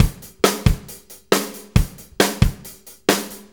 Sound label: Petty, Drum Tools, Loops; Sonic Foundry (Sony Creative Software); Loops & Samples Drum Tools